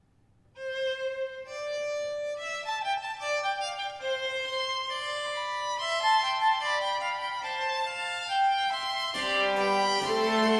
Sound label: Concerto 7 in D major 4. Allegro; Arcangelo Corelli; Corelli - Complete Works; Klassika